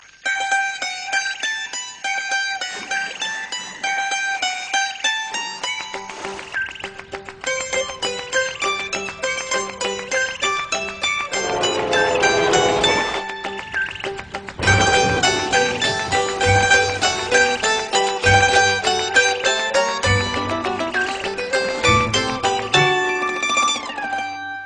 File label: Cool Piano Ringtone